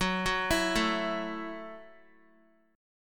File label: F sharp Minor 7th F sharp, Guitar; F#m7 arpeggiated; x,9,6,9,9,x